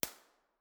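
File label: snow site 2 4 way, impulse response, Koli national park winter; b-format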